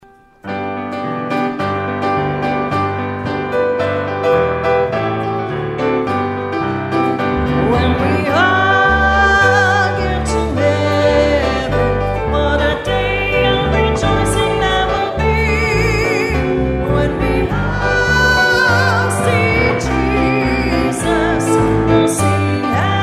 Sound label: Closing Hymn